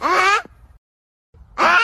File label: confused cross eyed kitten meme